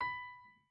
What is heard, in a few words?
piano